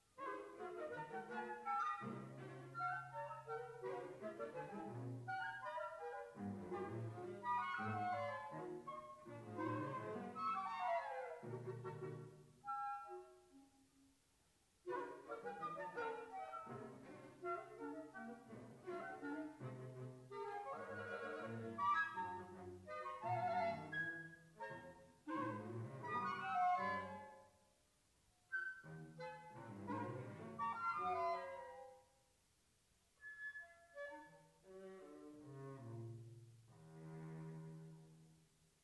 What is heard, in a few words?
Quartet Excerpt